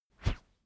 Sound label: whoosh wood cornered whizz slow